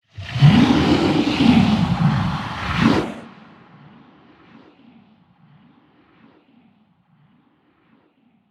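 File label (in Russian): звук монстров ужасов подготовка к битве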